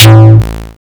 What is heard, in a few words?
tom